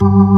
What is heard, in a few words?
ORG, Organs